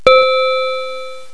BELL NOTE

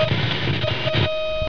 STATIC; JOSEPHIN

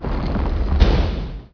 STONE

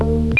BUTTON; BRAINBOX

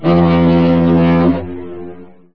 shovel; NIHILIST

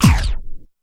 SCRATCH; BEAT2000

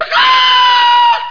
CHICK HIT; JOHNNY

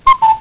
cuckoo

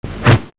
SMACK

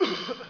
COUGH

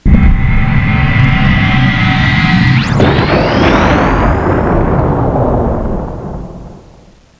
thumper; JOSEPHIN

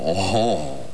"ohoo"

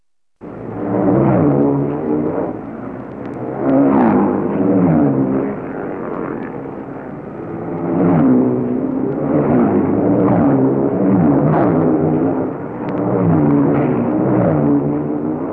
RACECARS

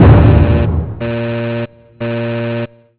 ALARM

